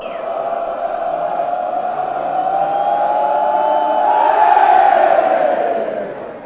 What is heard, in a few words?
goal kick fanchants; swfc